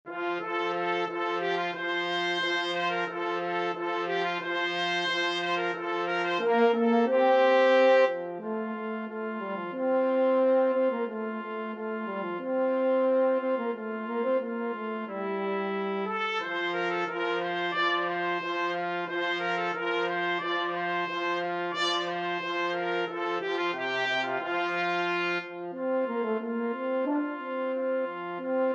trumpet french horn duet; vivaldi spring

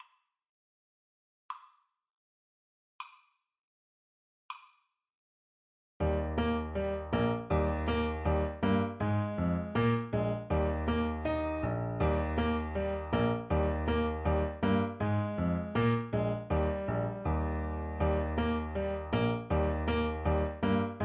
ecossaise, Clarinet

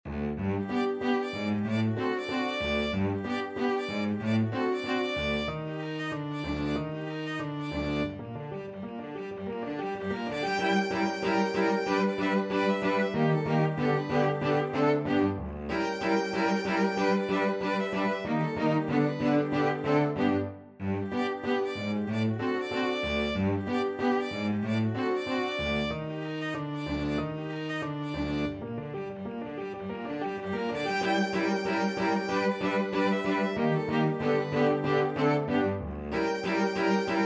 piano quintet

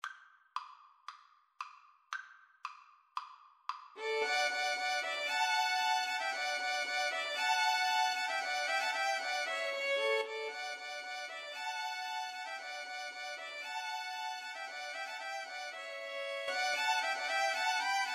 spring, Violin Trio

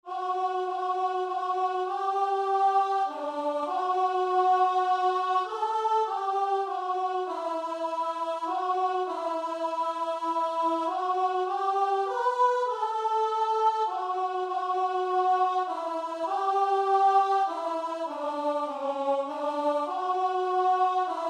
GV, guitar and vocal; breathe on me

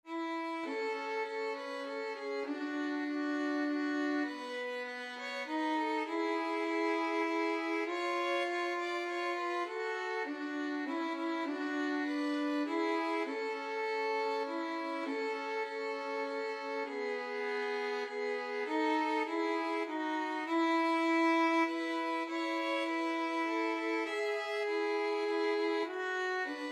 we wish, violin duet

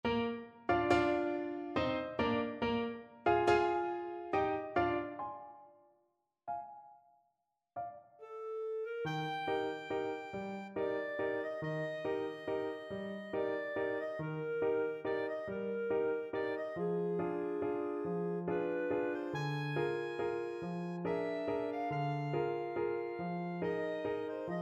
waltz, clarinet

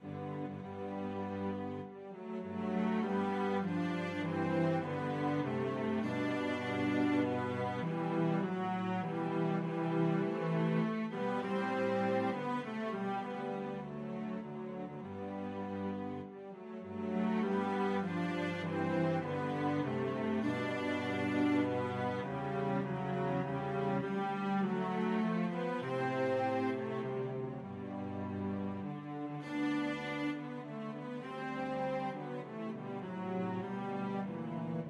nocturne, cello trio; mendelssohn

